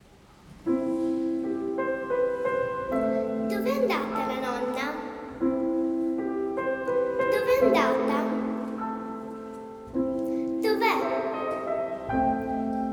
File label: La Nonna, school Choir